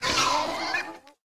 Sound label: Death